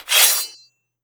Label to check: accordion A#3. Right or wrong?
Wrong